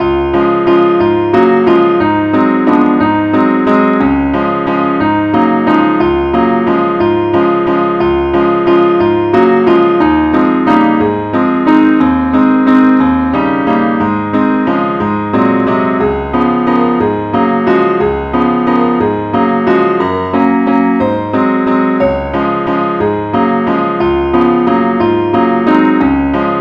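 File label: angel voices advance accompaniment key D flat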